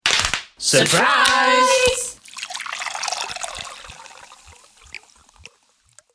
Surprise Party, Group voices shouting 'Surprise' with party poppers and drinks pouring; Holidays